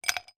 ice cube drop into glass; food drink

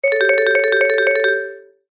cartoon flutter vibes

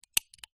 leisure toy cap gun dry fire

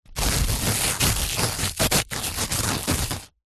foley dirt thin layer scrapes

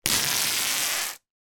office large strip of parcel tape rip from table

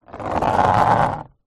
household chair plastic patio drag concrete

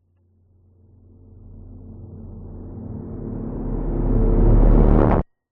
sound design reversed distorted hit cinematic

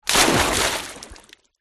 nature water splash

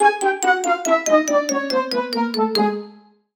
cartoon descend orchestral

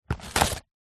cardboard rip open; foley

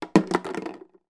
toy stickle brick put in plastic tub; sound effects one

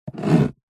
office handwriting pen draw line on cardboard box

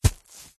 sponge wet hit dab squelch; foley; zapsplat

